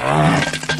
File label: fart shitty